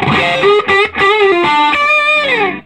SOLO, Vintage Blues Guitar; USB Soundscan vol.22

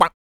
KUK, MALE PERC; Spectrasonics Vocal Planet; Jazz FX

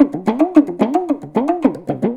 PAPAN, Indian Traditions; USB Soundscan vol.45; AKAI

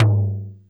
RSF DD Tom, DD-30

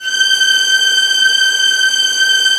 VIOLINS, Synclavier Strings; ILIO

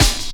Snare, Percussion Hits; Zero-G Jungle Warfare 1, 2 & 3; Drum Loop Hits; Cha Hits

